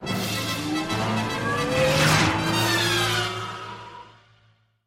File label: Outro; lego star wars; Battle Over Coruscant